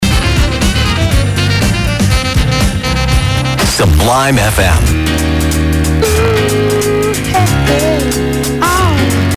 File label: holland id, tropo